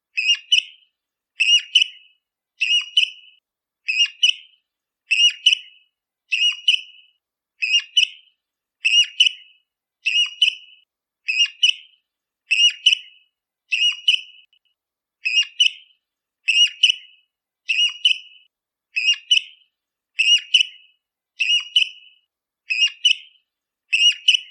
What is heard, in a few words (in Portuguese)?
Canto do mergulhao de cara preta